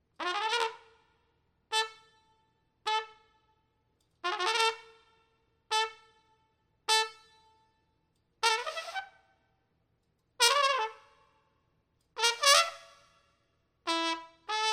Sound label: Trumpet; MegaEpix